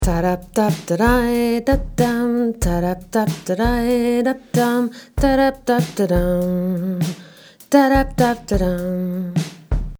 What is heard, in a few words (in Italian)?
voce drums